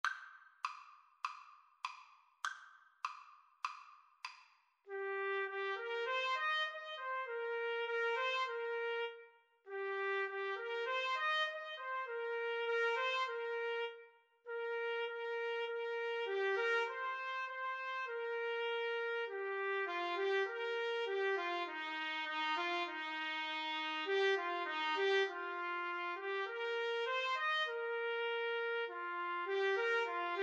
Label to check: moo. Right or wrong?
Wrong